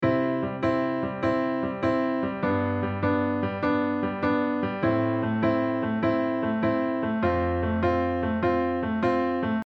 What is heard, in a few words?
shuffle